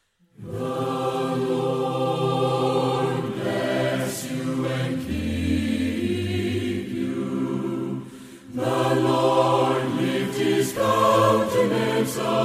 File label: choir